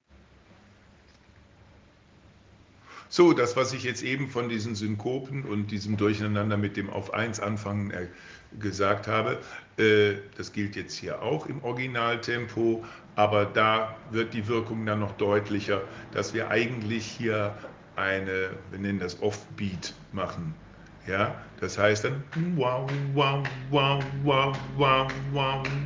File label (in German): Engel Alt originaltempo; Uebedateien